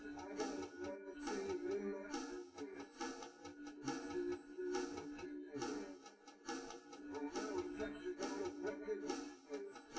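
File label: with drums live rec 250 ms 1 m; SiSEC10; underdetermined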